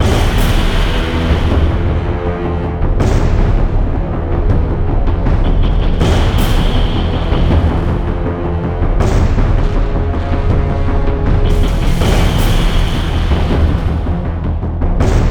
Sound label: event, music; Quake 4 Alpha